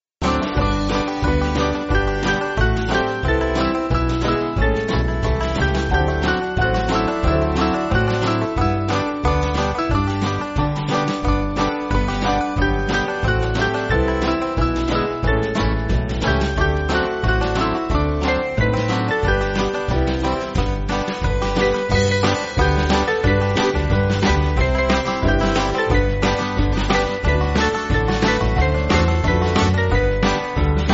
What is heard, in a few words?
Band, church music